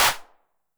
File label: HAND CLAP, 909 Filter